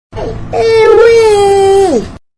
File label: eweee comedy sound effect